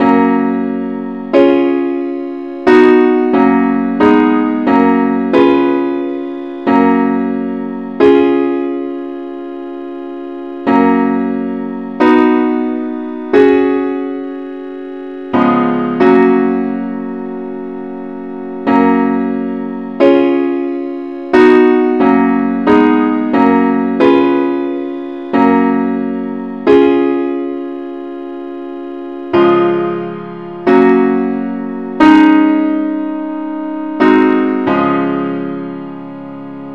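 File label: simple Accompaniment key fis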